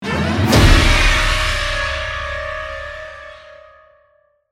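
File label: Music Stinger Snow Small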